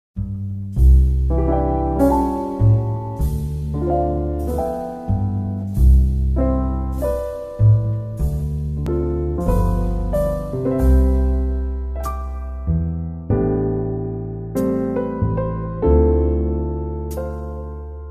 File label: Instrumental